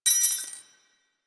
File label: Belt Fed Rifle Links; Warframe; Tenno; Frumentarius